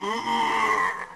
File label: lose